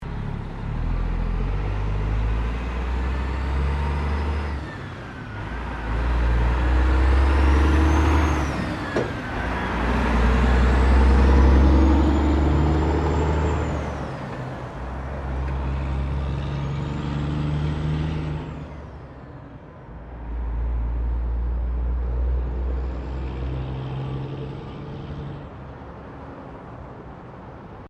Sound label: Traffic Semi Trailer Drive Off; General traffic sounds; Traffic and Cars; SoundEffects-Foundation4